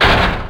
door gate close; left4dead

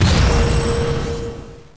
coins box spawn

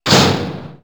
door metal close; left4dead

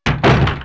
door wood close; left4dead

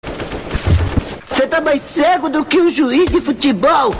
knife; cstrike; ultimate sounds

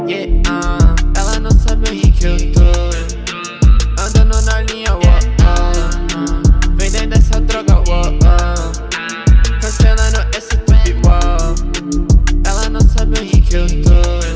music

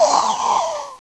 zombie die; cstrike; zombiebot

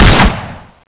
gunkata skill

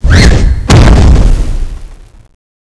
attack; CSO; LightZombie; cstrike

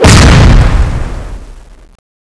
charge attack, weapons; balrog9

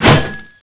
catch, weapons; guillotine

